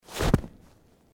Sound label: footsteps snow